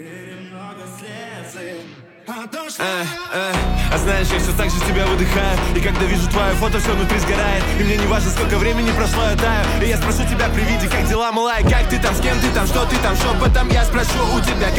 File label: funk remix